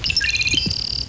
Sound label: beep